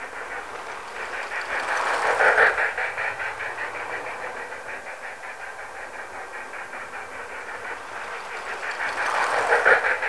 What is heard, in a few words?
sound o power, tinplate trains